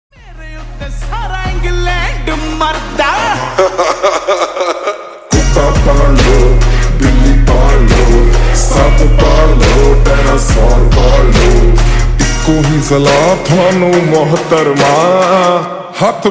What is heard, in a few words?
Slowed Reverb Ringtone